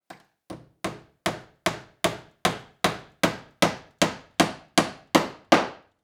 hammering nail; mechanical

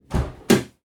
van back door open; transportation

